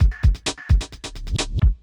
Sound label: electro kit 130